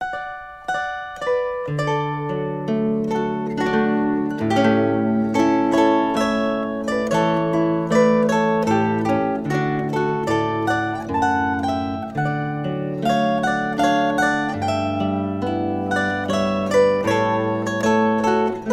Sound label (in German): Weis, zither